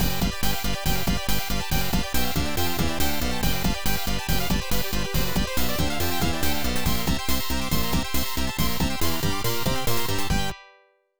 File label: Taxing Rating Jingle; lemmings 3d pc